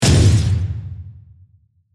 Hit Dark Debris, Hit, Dark, Debris, Break, Accent, Transition; Imaging Element; Production Element